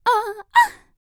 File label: Vocal, R&B & Dance, FEM. HUMAN